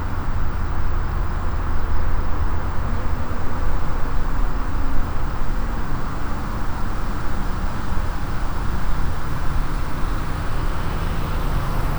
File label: engine hi